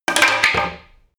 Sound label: wood drop